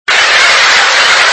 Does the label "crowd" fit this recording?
Yes